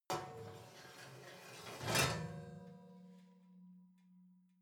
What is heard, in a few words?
oven baking sheet draw out soft